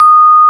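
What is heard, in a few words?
VIBRA C6, percussion; AKAI S-Series CD-ROM Sound Library VOL-8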